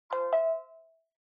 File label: Smartphone UI Sound Speaker